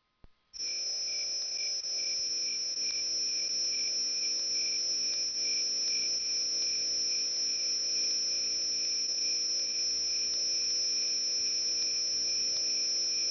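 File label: priroda cikada, nature cicada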